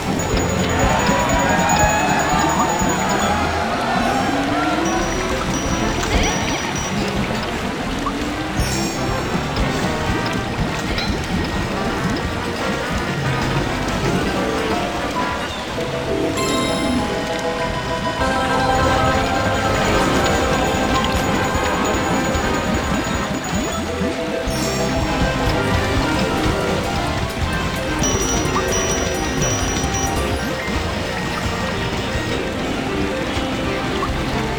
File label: Wolf Loop; Real Deal Slots; Lobster Larry; SlotsOL